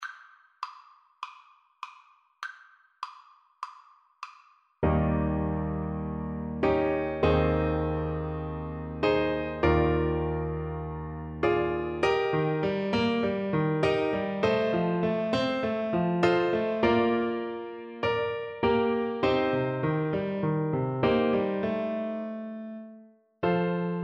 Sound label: Oboe